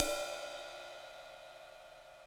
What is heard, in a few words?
Ride Acoustic Crush